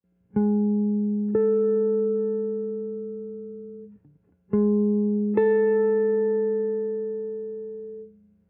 Intervals b9

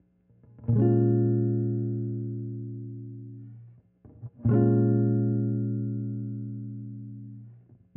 chord quality Bbm

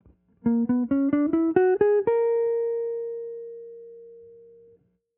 scale Bb altered

